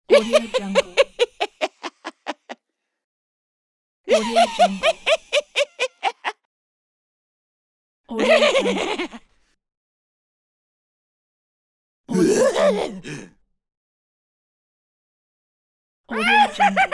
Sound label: halloween clown laugh